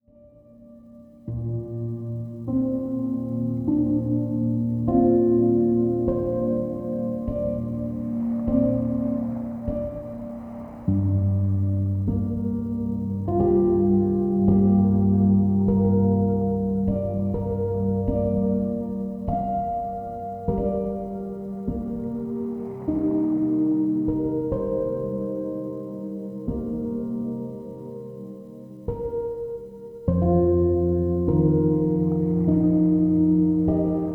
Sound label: Piano